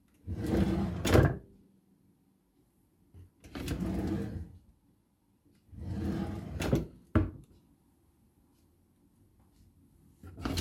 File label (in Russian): kuhonnyj lezhat skovorodki